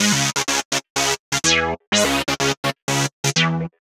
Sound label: Rambling Seqs, Synths; Sonic Foundry (Sony Creative Software); Underground UK House & Electro